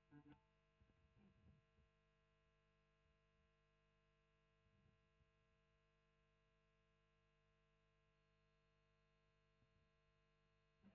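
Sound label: Electric guitar Royer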